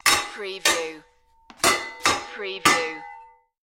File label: Metal Banging